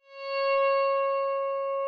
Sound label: NYCKELHARPA C#2; SoundBytes - Early Patches